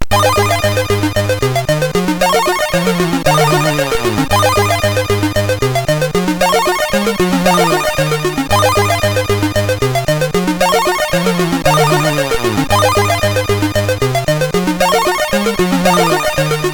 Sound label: Digital Fantasy, MOS6581R4